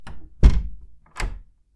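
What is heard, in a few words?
close door lock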